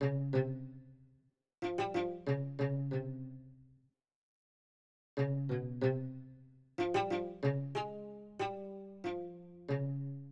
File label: Santur, Hip Hop Exotica, 093 Amin